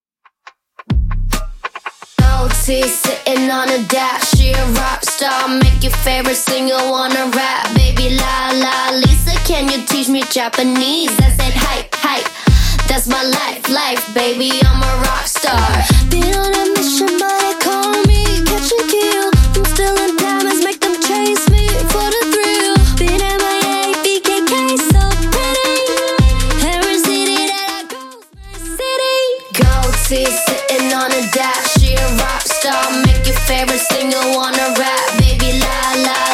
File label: Top40 Redrum 140bpm